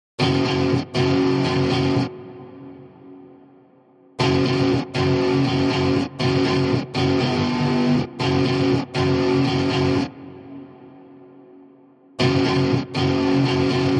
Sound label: Chase Lead Riffs